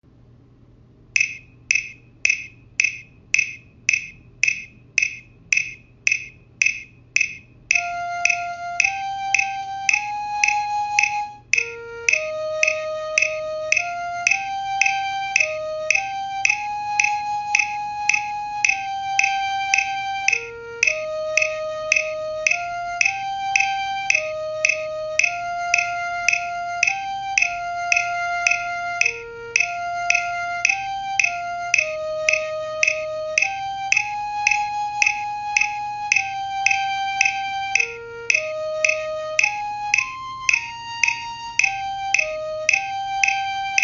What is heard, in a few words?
flute